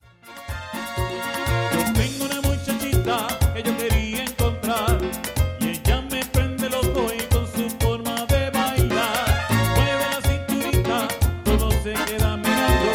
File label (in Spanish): como baila merengue with voice